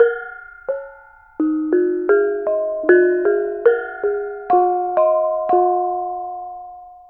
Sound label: BONANG; Spectrasonics; Heart of Asia; BONANG PH